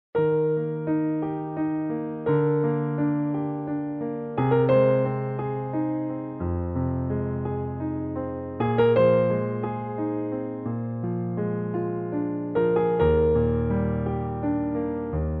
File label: piano solo